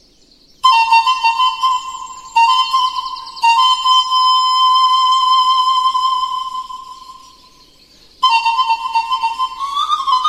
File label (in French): Doina, Roumanie; Zorilz (Doina lui Andrei Livinschi)